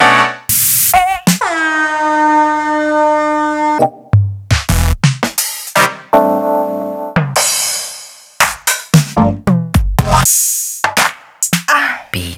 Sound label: Korg Volca Sample, Samples Sliced; m8